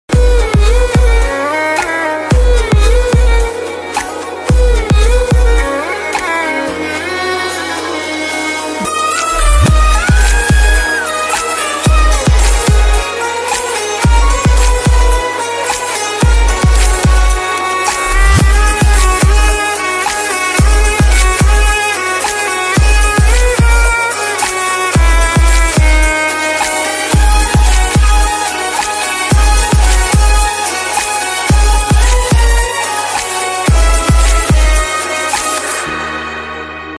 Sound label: tamil love bgm ringtone south love bgm ringtone